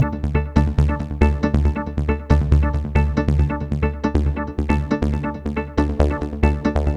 Moogyourass, 138 Dmaj, Hardcore Breakbeat; Big Fish Audio; Hardcore Breakbeat Action

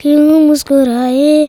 CHILD VX C, Indian Traditions

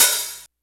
cymbalize; E-MU Formula 4000 Series Vol. 5; Protozoa; Phatt Presets

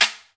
Guiro Wood; Club-50 - Foundations Roland

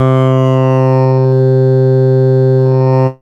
STRING PIZZA; SONIC ELEMNT; SONIC EL YES

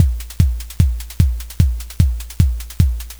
Anabeat Mix, Proto Techno; Sonic Foundry (Sony Creative Software) - Crimson Blue and Fabulous Horncraft 4 RnB

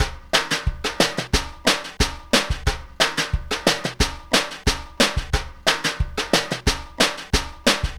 Lounge Drumz Dry, 090; Construction Kitz (Lounge); Zero-G Kocktail Kollection

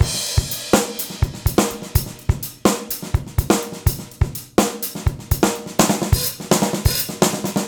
125, Beat Loops; Carnage; Zero-G - Monster Beats

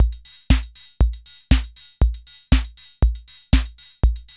DISCO, KR55; INIS; Funky Xtreams

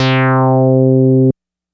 Nord One Shot, synth; Sonic Foundry (Sony Creative Software); Electronic Point Blank

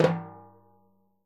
TIMBHI, Synclavier Percussion; ILIO; Synclavier Percussion 2; World & Orchestral

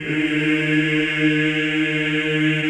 CHO ES2, Eeh; Eeh + Ehh

